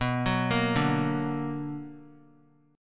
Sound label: B Minor Major 7th; arpeggiated; JGuitar